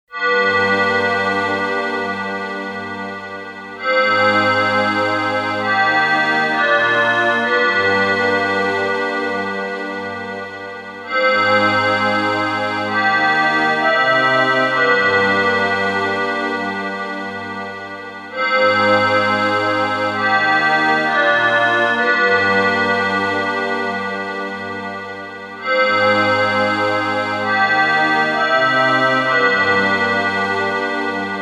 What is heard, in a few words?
Proteus 3; DarkWorld